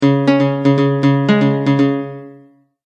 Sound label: Multimedia Tone-Thinking/Processing; Sound Design; McKinney Sound